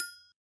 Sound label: RX8 Ago HI, Yamaha RX-8